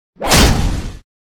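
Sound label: real blackguard attack, monster; thedead3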